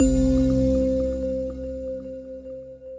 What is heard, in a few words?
prize bonus; Great Book Of Magic Deluxe; Wazdan